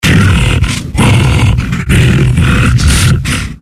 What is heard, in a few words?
fleshpound alert; slender